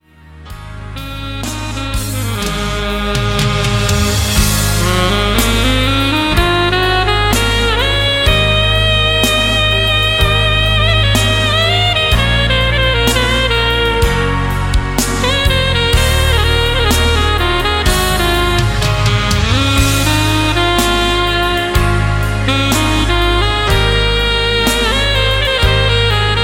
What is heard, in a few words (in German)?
Alt Saxophon